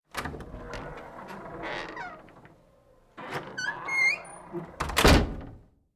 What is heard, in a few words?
opening closing heavy door